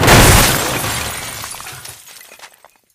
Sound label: van inside hit wall